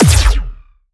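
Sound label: Sci weapon Shot Medium Blaster Punisher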